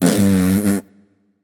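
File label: nonverbal tongue; voicepack; smite; merlin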